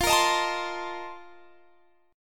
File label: Bb Major 7th Suspended 2nd Suspended 4th